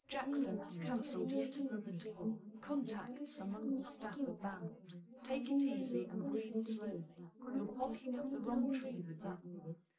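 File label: female, live recording 250ms 5cm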